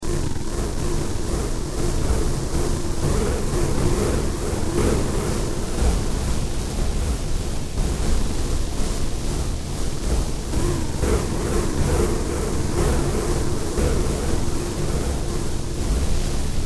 Wings In Flight, Slow motion flapping of wings in flight; Birds; Animals